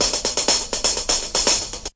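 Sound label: 123 bpm accent on the hats; 121 to 130 bpm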